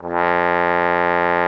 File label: TROMBONE F2; Xsample VOL-3